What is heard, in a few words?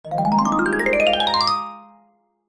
cartoon rise climb ascend magical; zapsplat